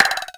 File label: karateman bulb; thirtydollarwebsite